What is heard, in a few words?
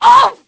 dead wom, female; Pirates Of The Caribbean